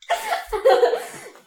giggles and laughter; SyrinScape

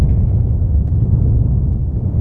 end rumble; The Elder Scrolls III - Morrowind; Morrowind GOTY GOG

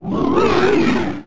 moan

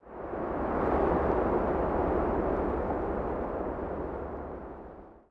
wind DES; The Elder Scrolls III - Morrowind; Morrowind GOTY GOG

